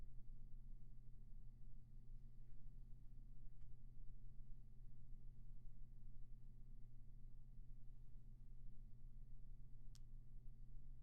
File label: Roomtone